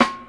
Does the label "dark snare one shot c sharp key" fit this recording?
Yes